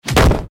body slam; Muerte; Lucha Rumble

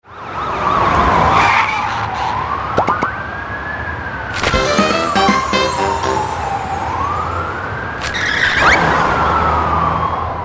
police car win; Beat the Bobbies 2

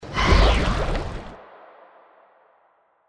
trident rotate; Champions of Poseidon

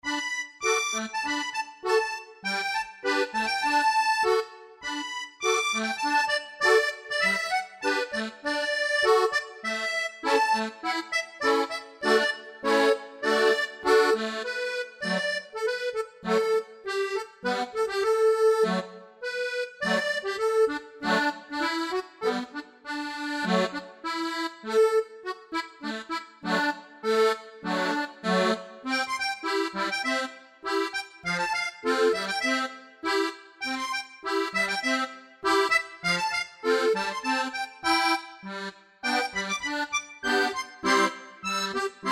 music background accordion; Lucky's Fish and Chips

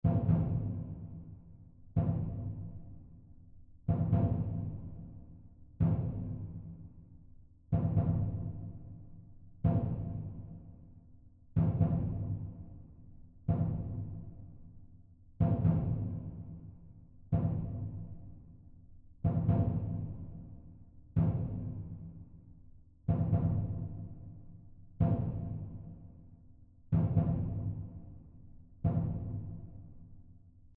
tension Loop; Dynamite Digger Eureka

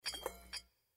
spin miss; Eternal Wishes LuckyTap

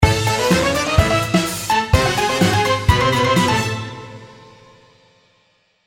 win mega; Beat the Bobbies 2

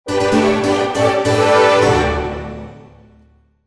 fanfare; Enchanted Prince 2; Eyecon; frog Triggered

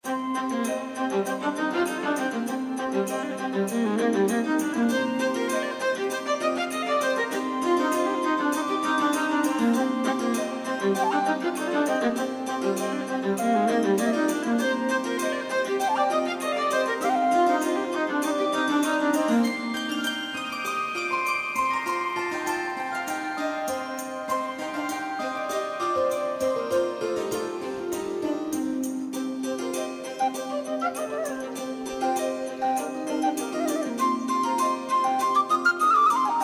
Background Music; Clover Rollover 2

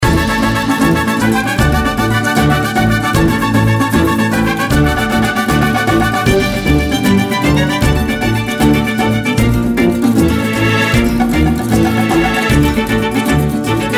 free spins music; Lucha Rumble

